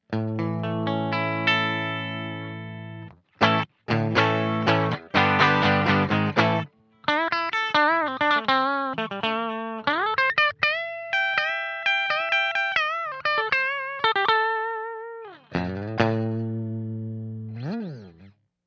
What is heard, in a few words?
greco clean